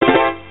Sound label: Credit SFX; TH Strikes Back; Arcade